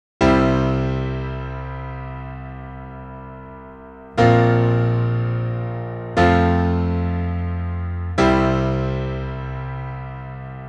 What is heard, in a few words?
Piano